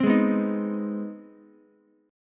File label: C Minor Major 7th Sharp 5th chord, Ukulele; strummed; JGuitar